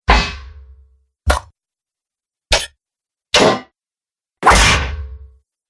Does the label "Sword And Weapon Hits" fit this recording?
Yes